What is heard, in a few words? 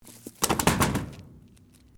ROCKSLIDE; Material components for constructing more complex sounds